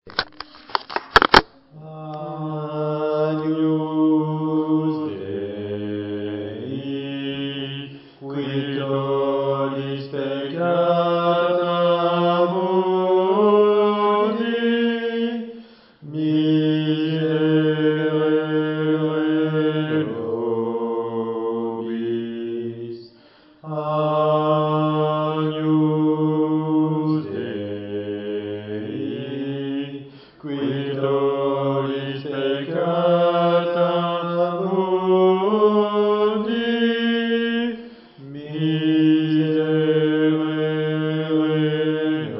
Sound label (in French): Voix de basse tous; Agnus St Jean